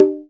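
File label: PER PROCU, Procussion, percussion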